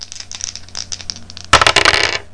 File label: dice roll; Bones